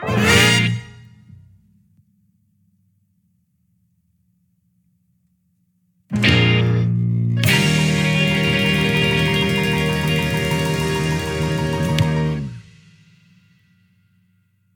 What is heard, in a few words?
backing track